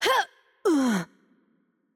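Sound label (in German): Hurt Heavy, German; de DE; VO; bloom minigame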